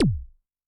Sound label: BD Rave, Yamaha DTXpress-II Kick